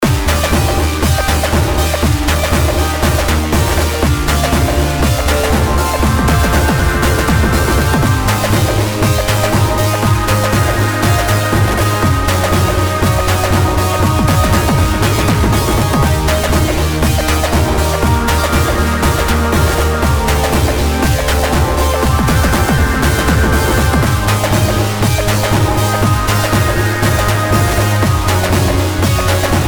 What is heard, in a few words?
sci-fi loop